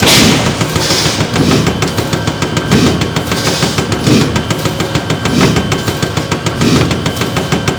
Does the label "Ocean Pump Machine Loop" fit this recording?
Yes